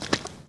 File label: step; wob2 demo